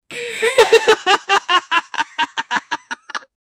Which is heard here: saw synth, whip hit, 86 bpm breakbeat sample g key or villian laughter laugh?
villian laughter laugh